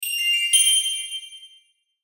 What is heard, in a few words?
Musical High Twinkle; Musical Sound Design; Blastwave